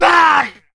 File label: man hit; Mount&Blade Warband